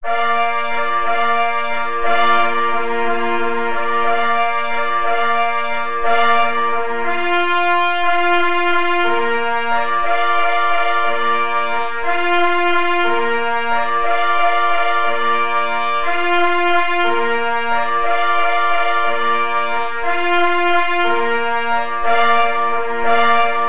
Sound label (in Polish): Capstrzyk mysliwski na 4 plesy